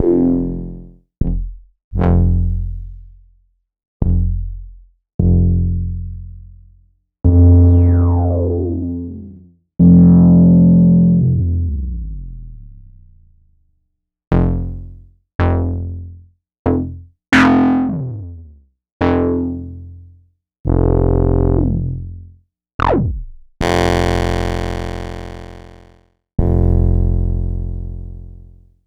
Korg MS-20 Bass

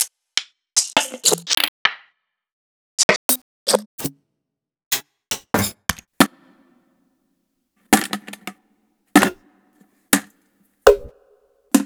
Foley

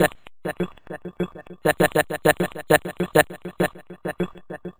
Mouth Music, Vocal; Sonic Foundry (Sony Creative Software); Myths of Technology

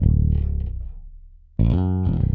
Rock, Bass; Bass X

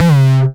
909 Grime C3, Kicks; Drums; MBase 11 - 909 Grime

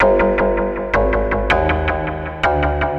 Echobubfx Bass, Drum N Bass; Sonic Foundry (Sony Creative Software); Knutrix Drum & Bass

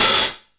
Bass Open Hat, Jomox Airbase99

